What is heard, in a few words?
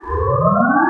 sine wave, electrolaser